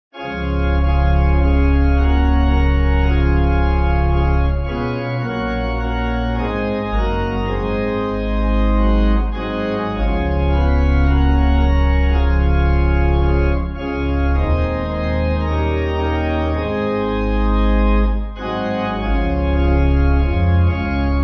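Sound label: Pipe Organ, Music Backing Files for Churches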